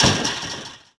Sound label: chain soft, Impact; Cars; XBOX